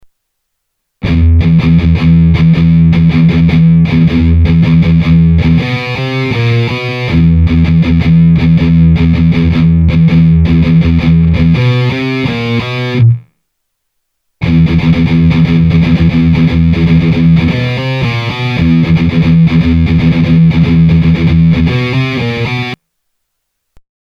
Hard Rock Palm Muting

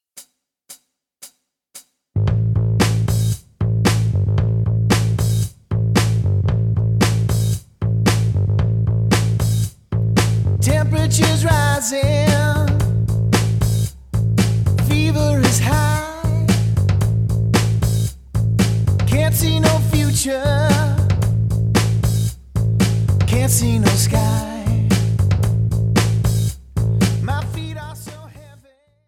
Minus Guitar

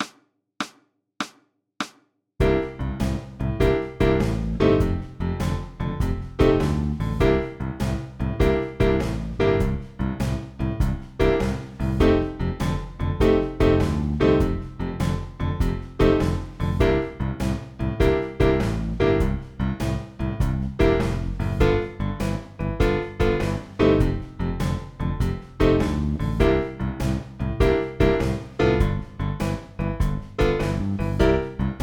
Blues Style

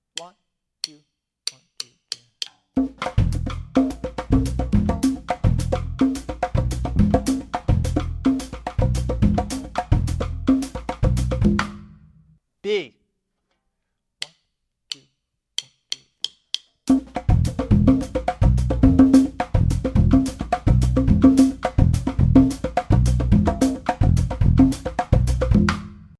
Sample Songo Rhythms

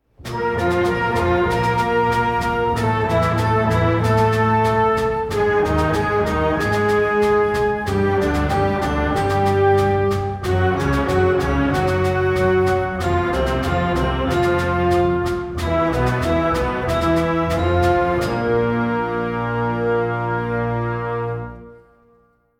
Exercise Chromatic Flexibility

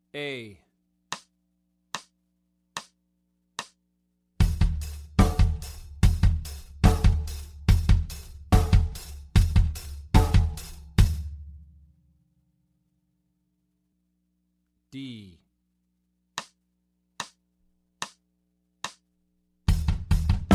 Double Bass Grooves

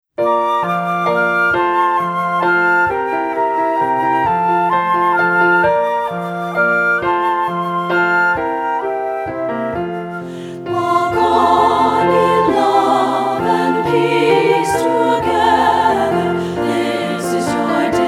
SSA

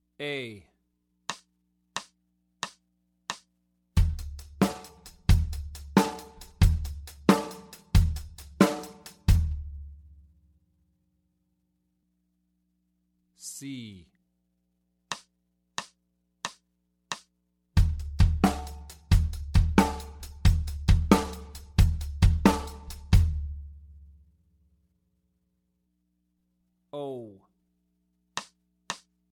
The Rock Shuffle

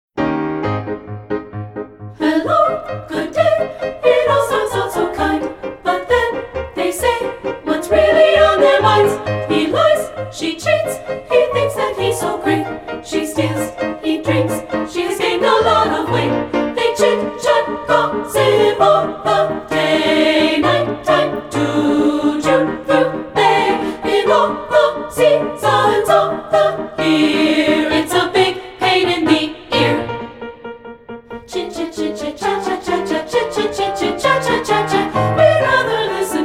Polka; Chit-Chat Polka

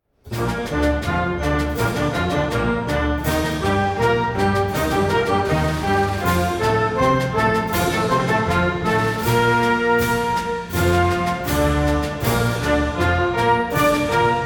Exercise - Arpeggios

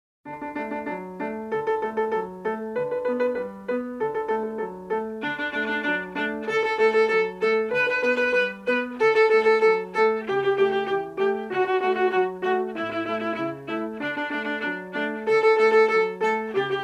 Twinkle Twinkle Little Star Variations

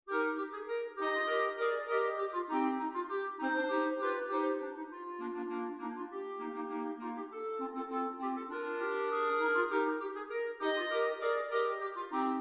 Fanfare Intrada

